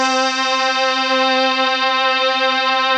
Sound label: ARP QUARTET; Rubber Chicken Software Sample Bank